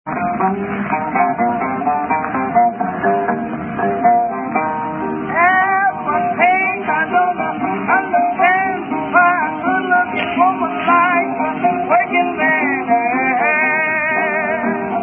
Blues; Roots Of Blues

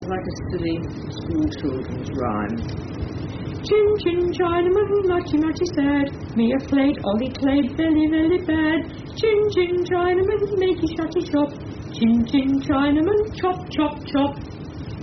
Singer, Female; 1970ca Chin Chin Chinaman Muchy Muchy Sad

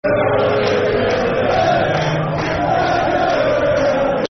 Football Chant (Soccer Songs); Celtic; fanchants-com

